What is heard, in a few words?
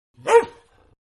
Bark, Dog; Dogs; Animals; Manhattan Production Music